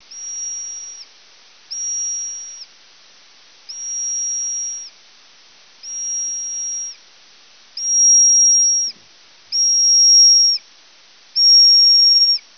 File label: Rhinolophus hipposideros